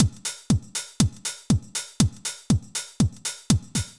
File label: House Drum NoSD Reverb